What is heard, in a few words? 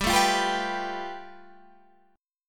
A 7th Suspended 2nd Sharp 5th